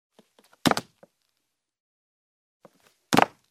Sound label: Jump on the wooden floor in heels; steps